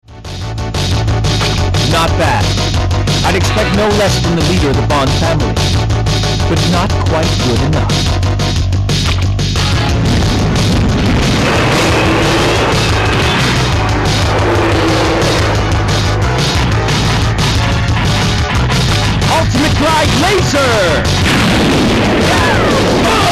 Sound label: Laser; UltimateGlyde